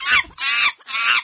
jungle critical stop; windows 9x; sound schemes

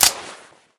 smg clip locked; Left 4 Dead; Source Engine

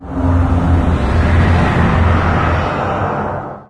cave hit, ambient atmosphere; source engine; hl2; video games

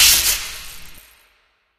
spark, coop electric

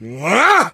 melee swing, player survivor voice; source engine; left 4 dead 2

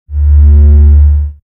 mothership loop; video games; source engine; tf; mvm

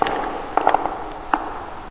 te move, tentacle; source engine; hl1; video games

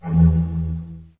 windows 98 beep; windows 9x; sound schemes

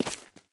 taunt yeti standee step; player; soldier; source engine; tf

